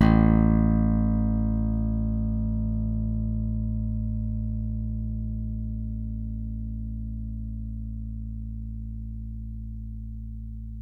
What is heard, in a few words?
thum, bass